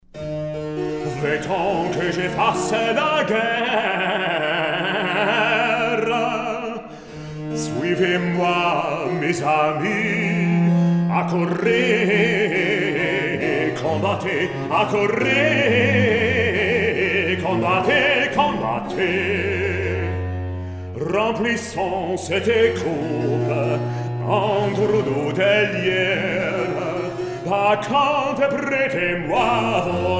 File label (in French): Recitatif; Bacchus